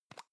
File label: plastic can lid screw open soft; objekte; dose